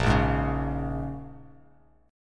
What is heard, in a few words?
A sharp Minor 7th, Bass Standard; strummed; 6,1,6,6